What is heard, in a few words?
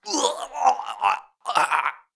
archer die; propack